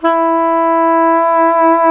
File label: Soprano SAX mp E4; Giga Samples Collection